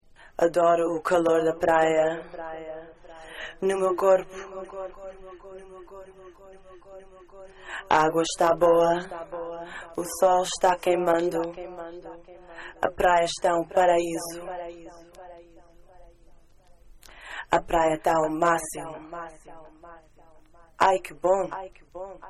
Acapella

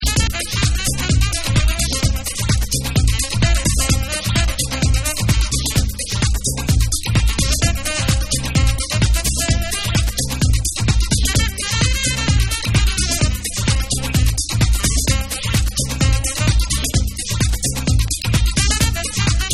JUNGLE JAZZ

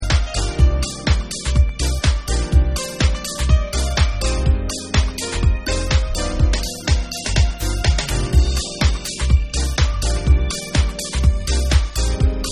Instrumental Mix

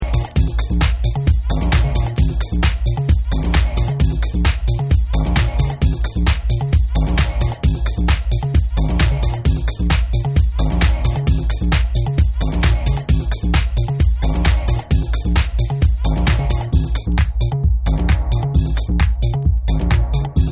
CLOSE TO MUSIQ Dub

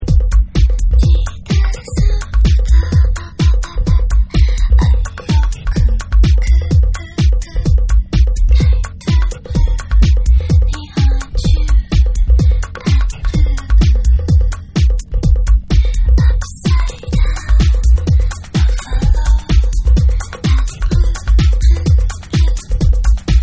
Vocal Mix

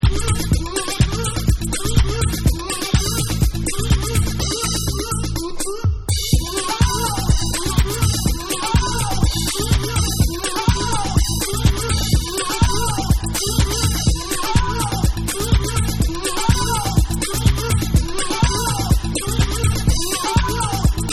Uk Hard House Mix

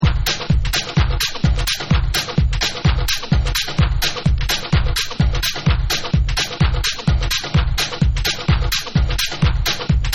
RHUMBA

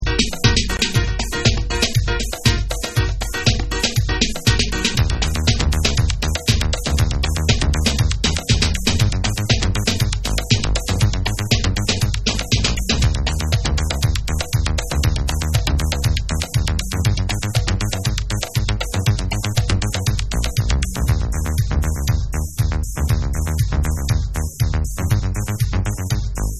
House Dub

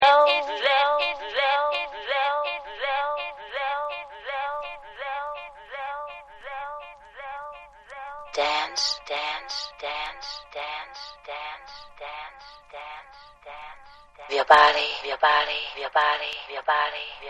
SHAKE Acappella

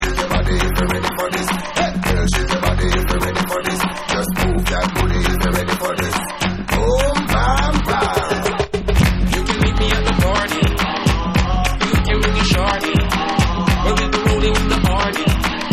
CLAP

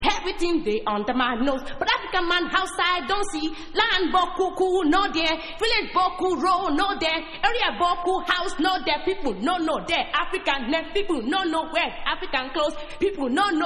ACAPELLA

